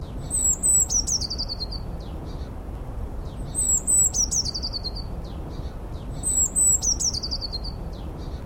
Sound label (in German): blaumeise